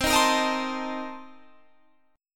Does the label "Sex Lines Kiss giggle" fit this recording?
No